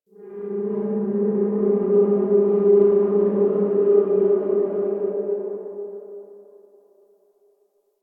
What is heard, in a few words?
Eerie Drone B; Warframe; Ambience; NineteenNinetyNine